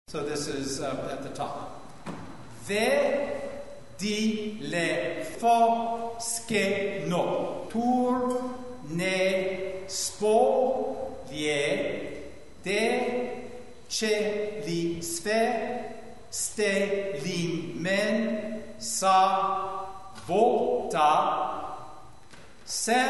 Anvil Chorus pronunciation

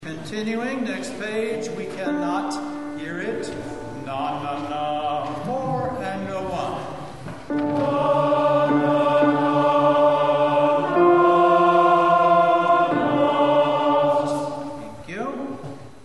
Serenade TB notes

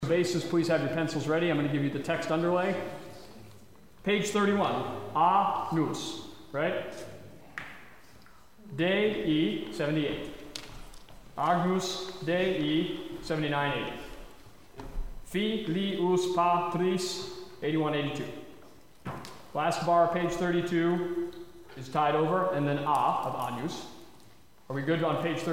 Bass Choir 3 missing text; rehearsal files

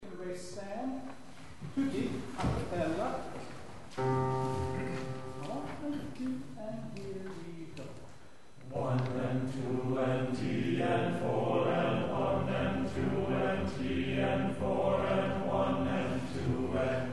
In Pace SATB opening learning

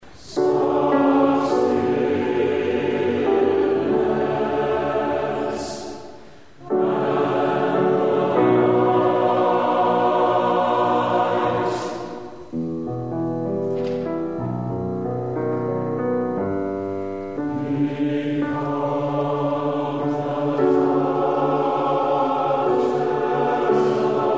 SATB sung

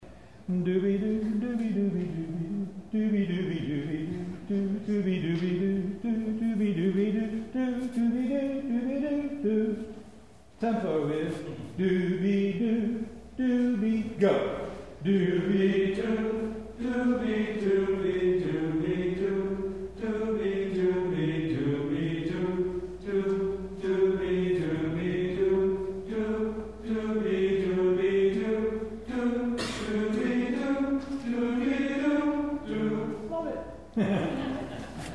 Bass w omitted notes; Handel

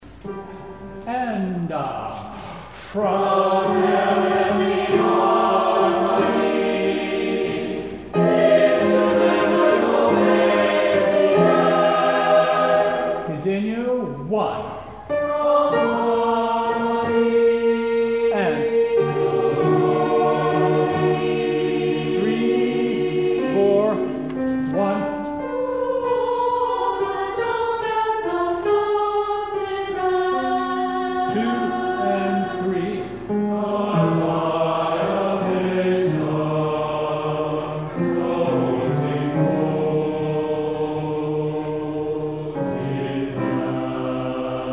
SATB

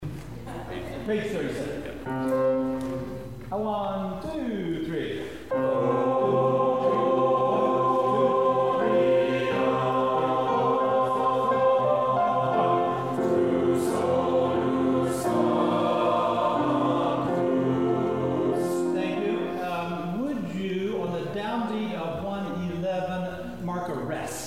Choir 3 only; rehearsal files

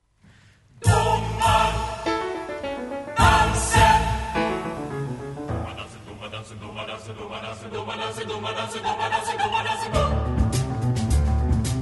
Chamber Choir; Domardansen; Eric Ericson

